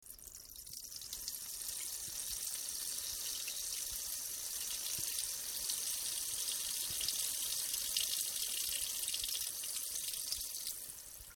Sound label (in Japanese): しょおおーっとおしっこ